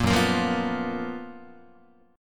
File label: Gb Major 11th Gb, Guitar; GbM11 strummed; JGuitar